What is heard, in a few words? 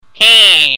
heh